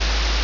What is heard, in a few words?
ambience room tone